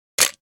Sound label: Camera shutter click taking picture